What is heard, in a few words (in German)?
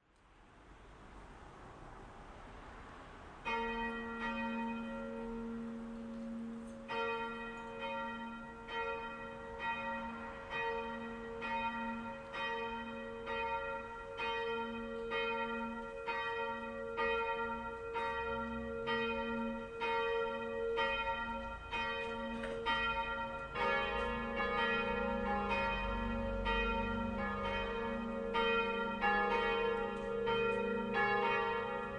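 Tonaufnahme f1 as1 b1, mehrstimmige Geläute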